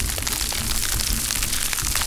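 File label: magic frost ready big loop; skyrim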